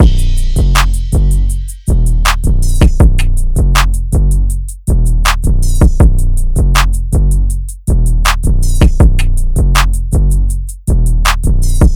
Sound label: 160 BPM Ebm; RAW